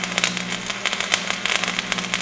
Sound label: torch hit